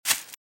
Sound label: Velociraptor - Footstep - Grass - Run - Right; RadwinIsland